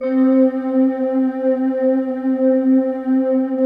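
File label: soft voices, Soft Voice Stack; Optical Media International; Sonic Images Library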